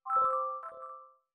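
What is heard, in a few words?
bell glitch C major; granular; accents; bespoke - Floral v.2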